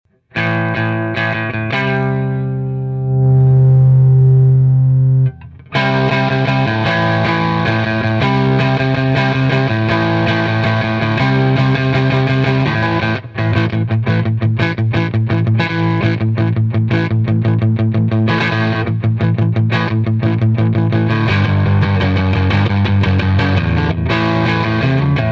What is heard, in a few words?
EL34 viola ampli; SanGregorio